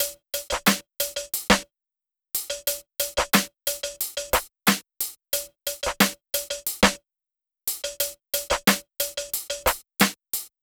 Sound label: 90 top loop, Breaks; PowerFX - Uptown Breaks